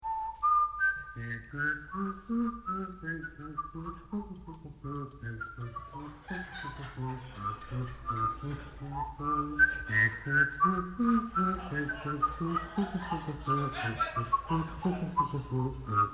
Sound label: simultaneous hum & whistle